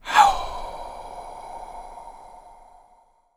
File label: ATTACK HOW, VOCAL WIND; ILIO; Vocal Planet; Jazz & FX